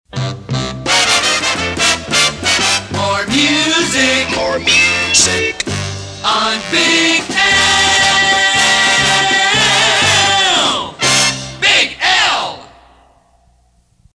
More Music; London; PAMS

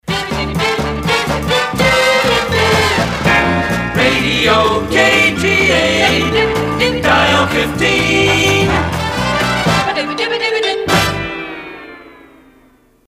Scat Power; KGA; PAMS

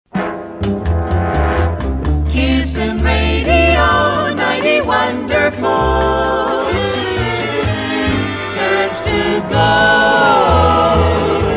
Turns To Gold; KISN; PAMS Numbered Series

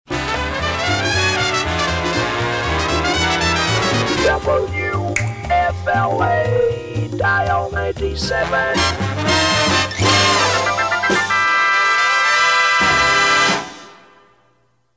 Swingin Organ Sonovox; PAMS Numbered Series; WFLA

